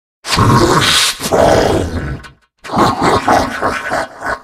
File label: ahaha